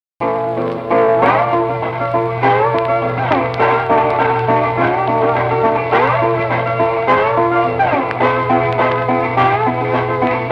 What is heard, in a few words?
Blues Gospel, Field Recordings